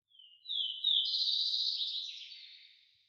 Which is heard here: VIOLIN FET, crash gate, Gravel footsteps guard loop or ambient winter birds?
ambient winter birds